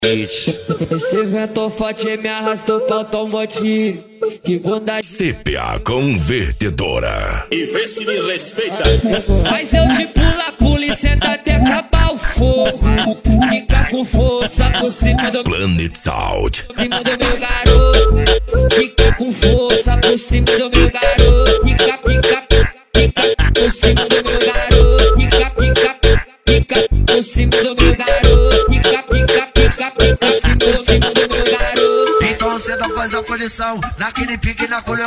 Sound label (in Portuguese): funk ritmado; cuiaba mt